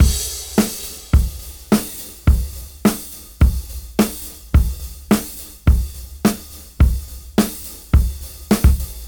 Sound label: Straight 105 BPM; AMG; Keith LeBlanc Phat Butt Jams